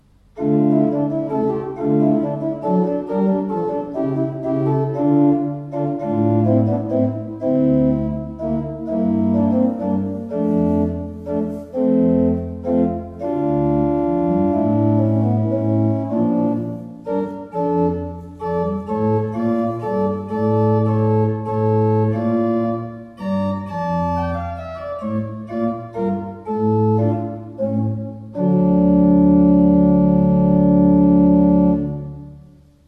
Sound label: Walcker, pipe organ